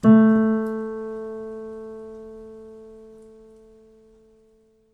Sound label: piano sample